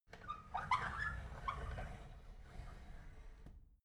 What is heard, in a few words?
drawer big squeak open soft